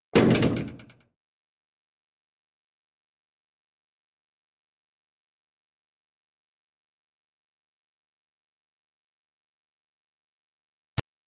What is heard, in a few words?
Track, Doors; 헐리웃특수효과음; 문 소리